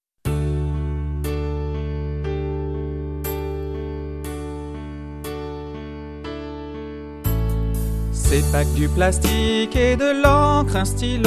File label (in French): voix; stylo